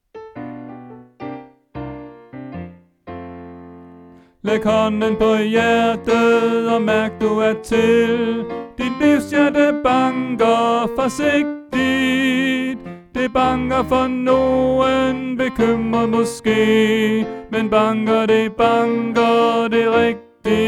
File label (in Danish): Tenor; oevefiler